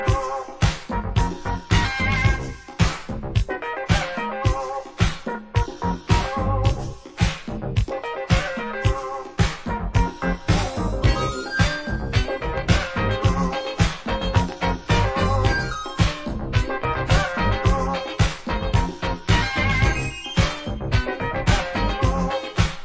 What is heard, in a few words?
Instrumental; disco